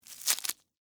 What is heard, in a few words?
Sticky Fast Rip, Texture, Sticky, Fast, Rip, Tear, Rubber, Gloves, Movement; Cleaning Sound Effects; BlastWave